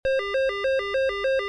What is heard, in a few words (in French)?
Téléphone